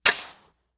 Industry Tool Shovel Sand Dig

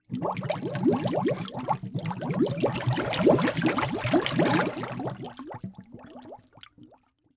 Liquid Water Water Blow Bubbles Into Water

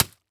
Horror Scary Flesh Hits Wet Bloody Ground

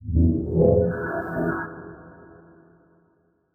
Transition Electrical Warble; Adobe; Production Element

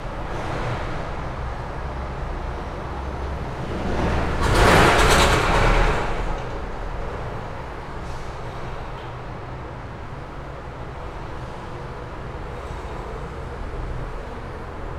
Reflective, City Traffic; Detunized; GDC Game Audio Bundle

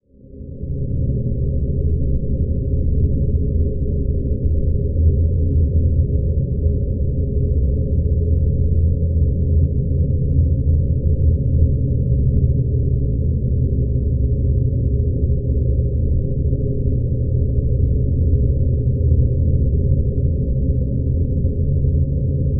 Drone Science Fiction Rumble Solar Wind; Production Element Imaging; AdobeCC

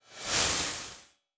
Transition Whoosh Short; Production Element; Title Transition; AdobeCC

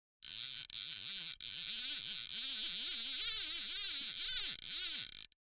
Sports Fly Fishing Reel Pull Quick

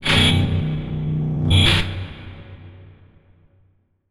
Title Transition Horror Dark; Production Element

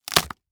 Crash Tree Branch Break; crashes; AdobeCC

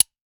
Foley Toy Gun Revolver Firing

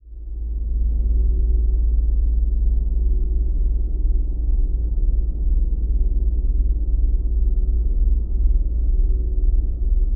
Drone Horror Organ Constant Steady Bed Deep Low; Production Element Imaging; AdobeCC

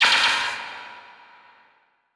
Title Transition Blast Energy; Production Element Title Transition

